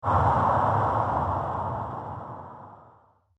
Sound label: Dungeon Impact Strike; Miscellaneous Impact Sound Effects; fantasy sound effect; Andrew Fleming